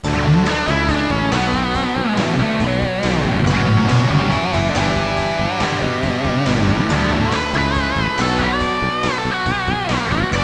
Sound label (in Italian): suonerie musica rock